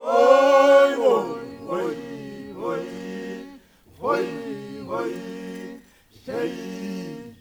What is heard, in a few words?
WHOI WHOAA, CHOIRS; Spectrasonics; Heart of Africa